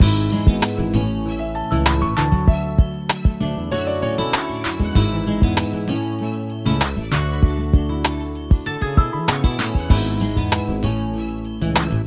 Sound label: background; RS Games Sounds; Left Center Right